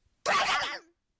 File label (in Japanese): ナイフで切る